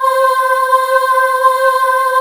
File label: ANGLE CHOIR C; ANGLCHOIR